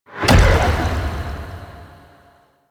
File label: Impact; Warframe; MonkeyKing